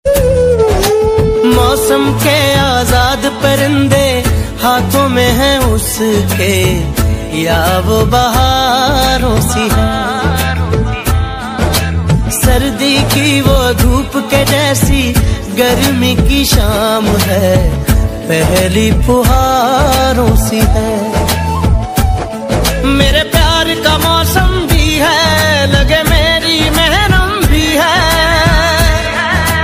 Breakup Ringtone

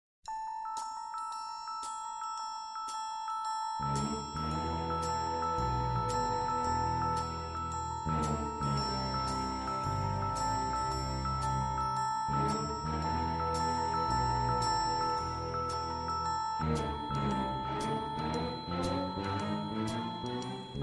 Alarm Ringtone for Mobile Phone

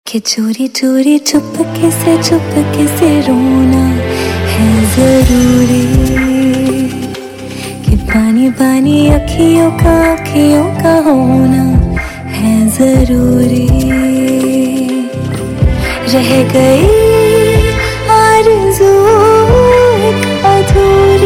Hindi Song Ringtone

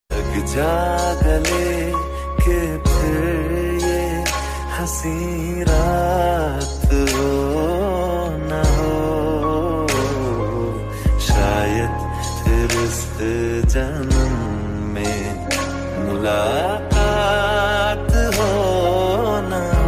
Romantic Song Ringtone

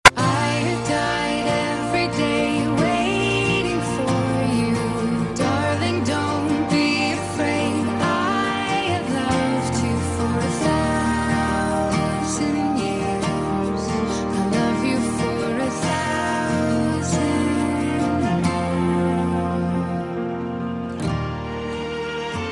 Hollywood Ringtone; ringtones

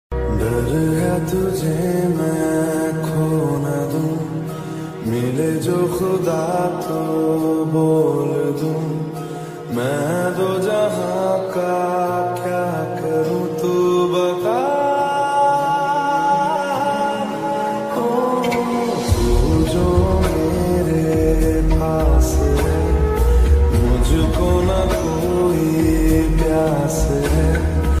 Sad Ringtone

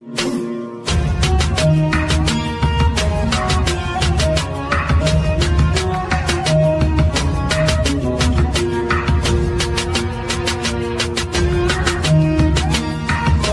English Song BGM Ringtone; ringtones

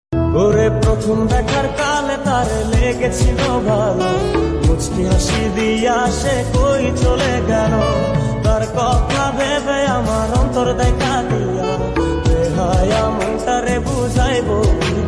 Bengali Ringtone

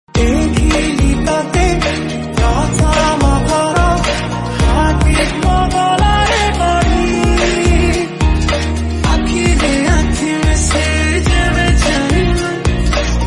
Odia Song Ringtone

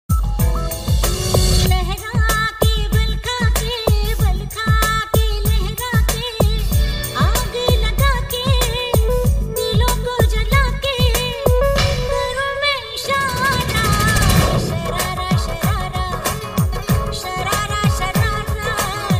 Romantic Hindi Song Ringtone

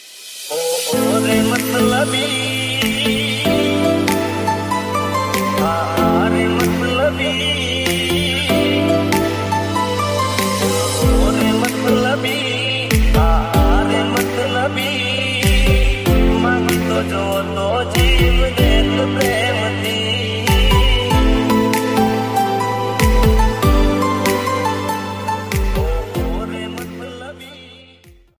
Gujarati Song Ringtone

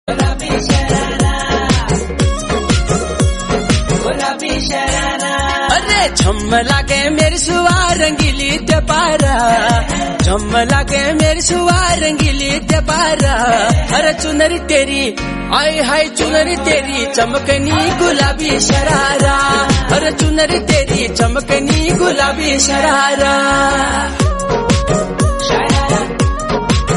Romantic Song

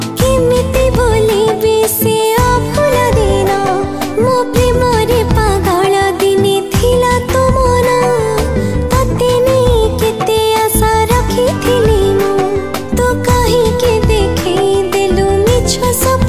Female Ringtone

